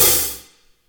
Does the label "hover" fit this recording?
No